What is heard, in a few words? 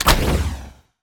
weapon; Surtr's Bolt